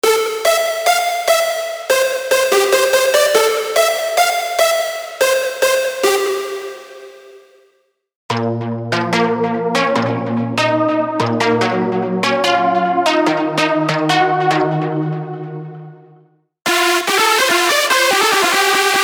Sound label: Melodies Hardstyle Hardcore